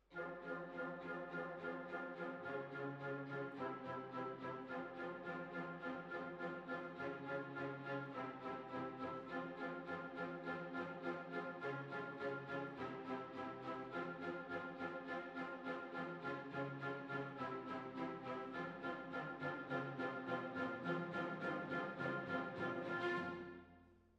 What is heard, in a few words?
Hall, Jazz for piano